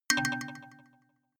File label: notification kalimba delay third